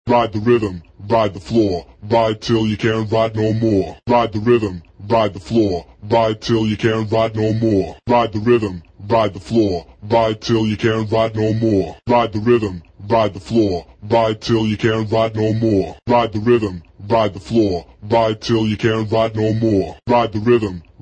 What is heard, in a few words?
accapella